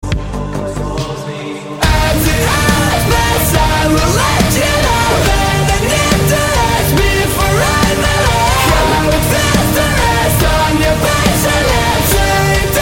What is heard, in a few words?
metal cover